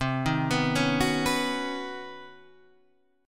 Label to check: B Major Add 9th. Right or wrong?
Right